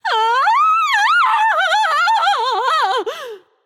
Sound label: fear; smite; voicepack; hera